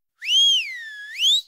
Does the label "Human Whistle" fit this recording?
Yes